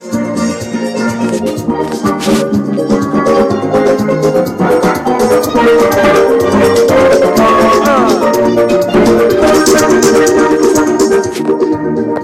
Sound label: Steel Drum